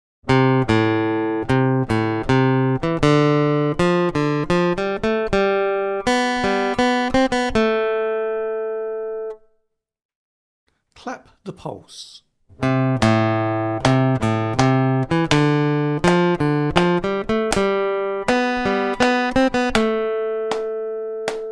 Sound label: Aural Rhythm Test, acoustic guitar; Grade 7